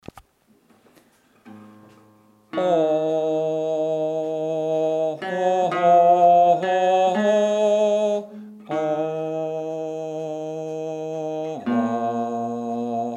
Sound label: bass; greensleeves